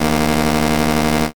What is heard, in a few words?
buzzer open